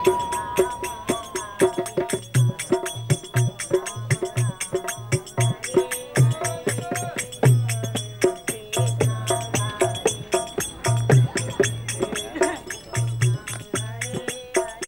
CHANT BELL, ETHNIC; Trance; AMG - Global Trance Mission VOL-2

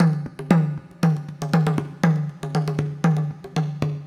FAST EAST INDIAN DRUM LOOP; Sonic Foundry (Sony Creative Software); Vir Unis Infusion

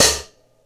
Vintage Closed Hi-Hat; KJ Sawka; Drum n Bass & Beyond; Drum Tool Box

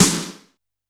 D2 - SD Rock L, Yamaha PSR-E323 Rock Kit

